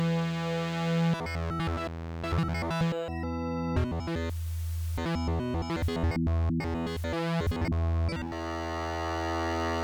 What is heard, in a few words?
Presets sweep thru; Behringer Victor